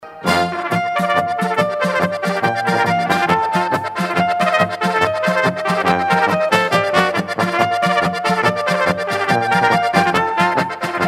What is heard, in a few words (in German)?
Schimmelgalopp